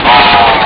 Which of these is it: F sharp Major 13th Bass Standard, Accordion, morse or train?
train